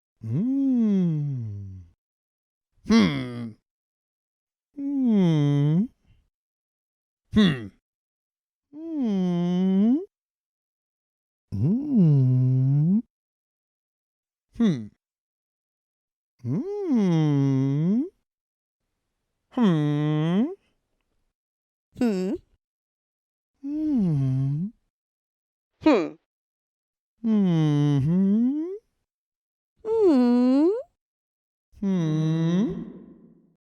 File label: "hmm"; هوم